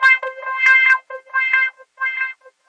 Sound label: echo of something, Guitars